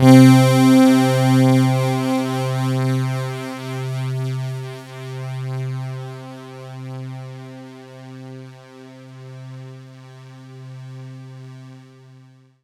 C3, Synth Line; 90 s Sample CDs; NiceBeats; SynthLine VOL-31

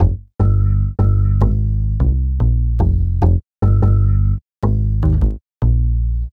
Standup Bass, Drum N Bass; Sonic Foundry (Sony Creative Software); Knutrix Drum & Bass

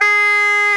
G4#, bagpipes; 90s Sample CDs; Club-50 - Foundations Roland

